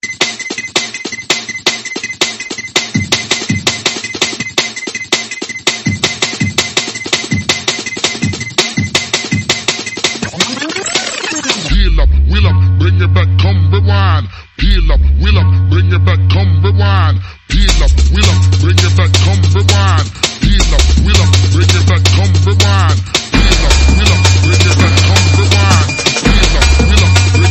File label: Noise Factory